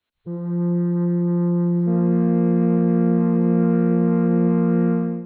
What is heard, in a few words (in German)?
flöte